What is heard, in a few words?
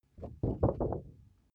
paper wobble long